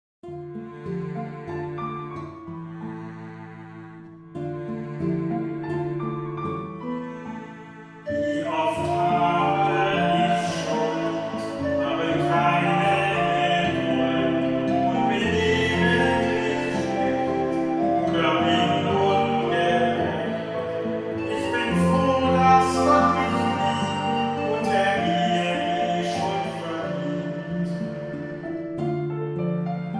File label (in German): Schuldbekenntnis Gesang; Kindermesse 2017